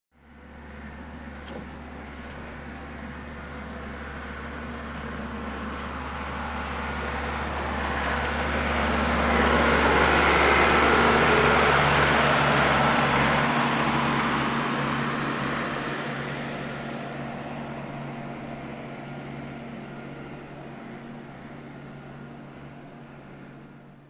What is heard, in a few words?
Construction